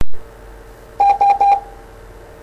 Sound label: Morse S